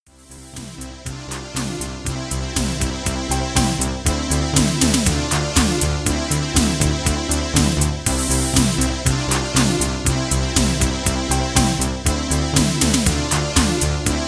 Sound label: Jingle Bells